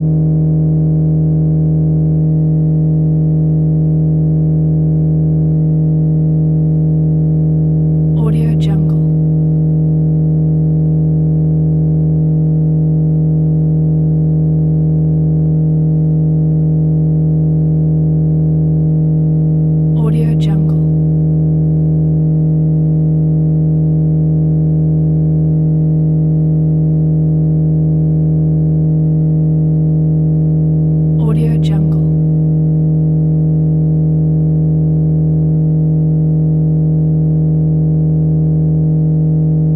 buzz

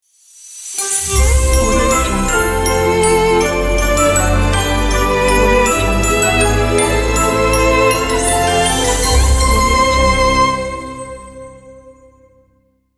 christmas ident

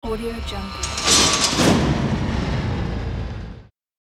power off

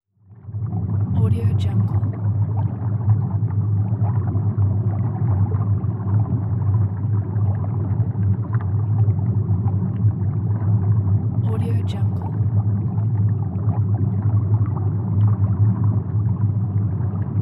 underwater; chicasound

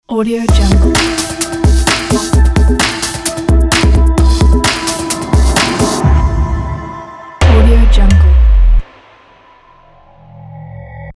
breakbeat logo

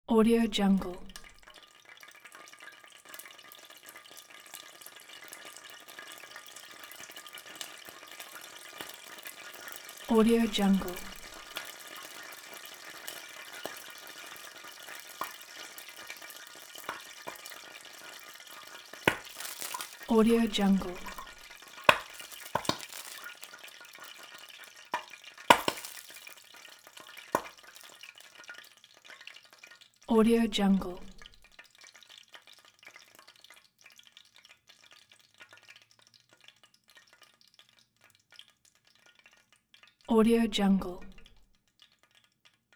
light oil sizzle in pan

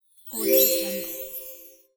collect item

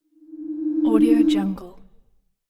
short metallic pass by; hollywoodedge

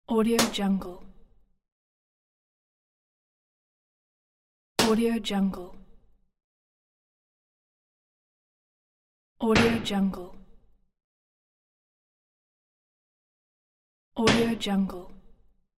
hammer

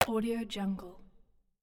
scifi interface hybrid switch

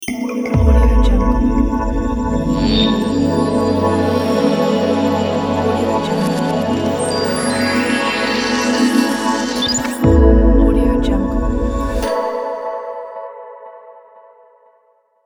atmospheric logo; baxton

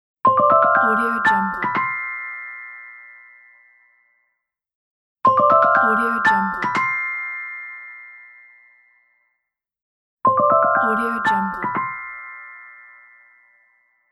magical bells

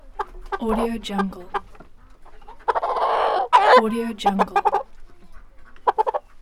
hen

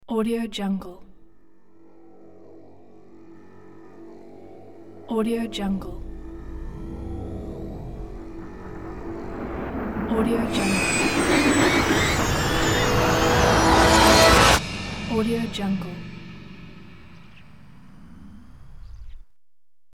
starship by buildup intense

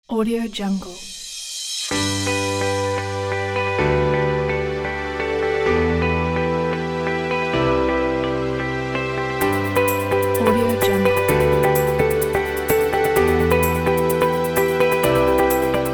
motivational uplifting corporate

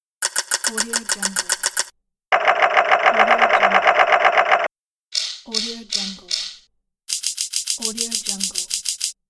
cogwheel loops

